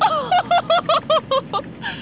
laugh; anime-manga

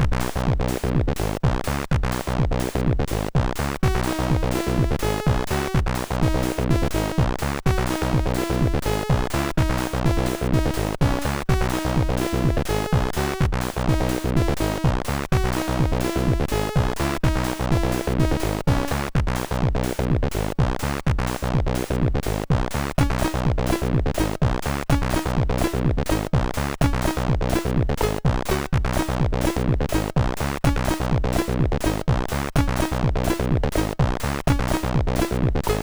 Folklor Techno, MOS6581; sid; MOS6581R3

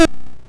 sid MOS6581R2

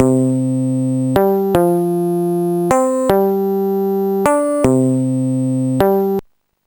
bass; Sonix Music Driver

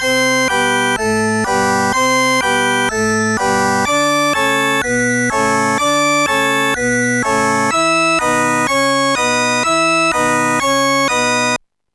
charge; Sonix Music Driver

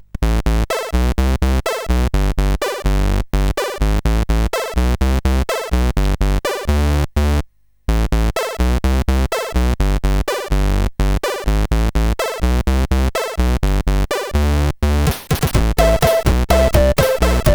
sid, CSG 8580R5

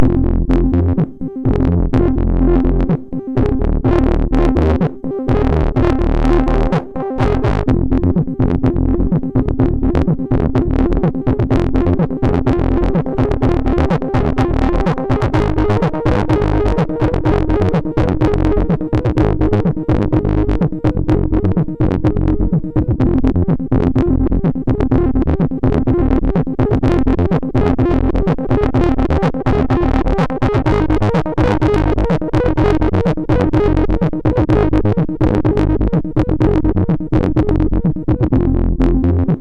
sid MOS6581R2

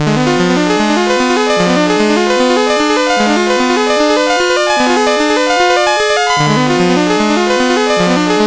sid CSG8580R5

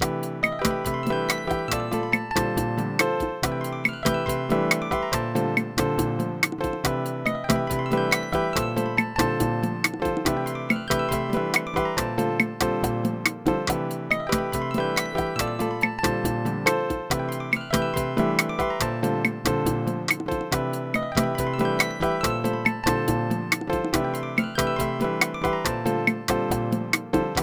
solo; Dr. T's MIDI Recording Studio

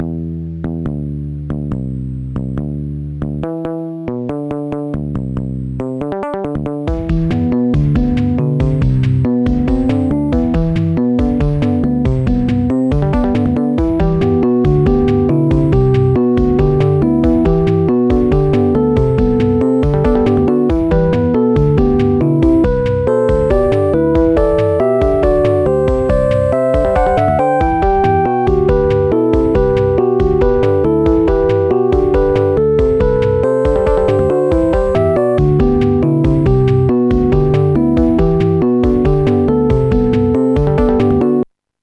not another piano; Instant Music

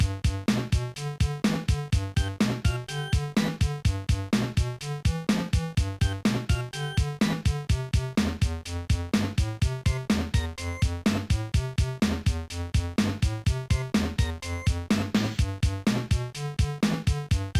Rock and Ram; Musicraft

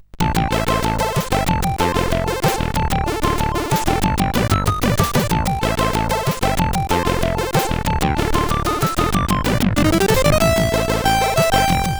tune, CSG8580R5; sid

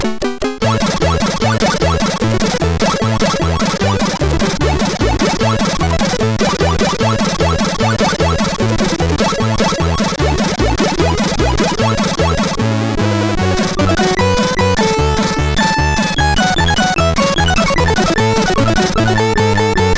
sid MOS6581R3; Heavenbound

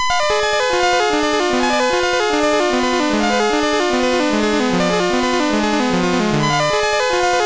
sid MOS6581R3

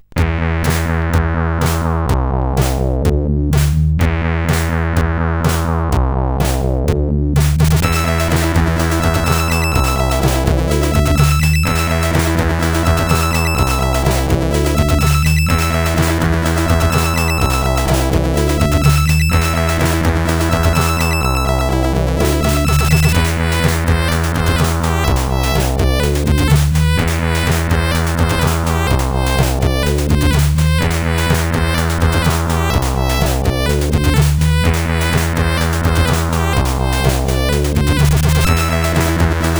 Filtr Music sid CSG8580R5

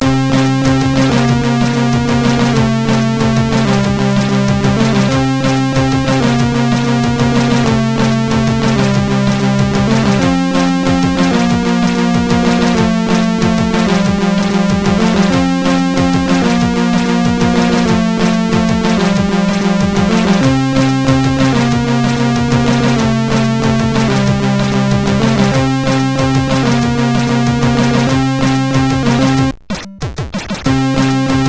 Intromusic sid MOS6581R4

